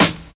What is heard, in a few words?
short snare single hit e key